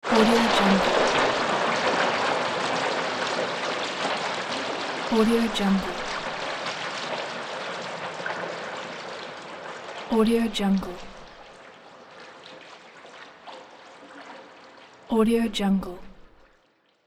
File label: underground water source